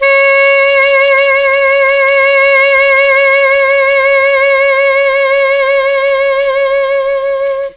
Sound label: C#3 ALTO SAX, Mellotron; Mike Pinder - Mellotron Archive CD; CHMB ALTOSAX